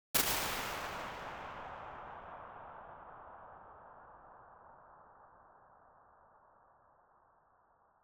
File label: DAMPED CAVE, Impulse Response